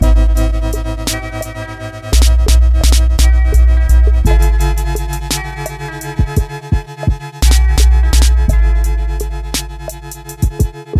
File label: Speed Instrumental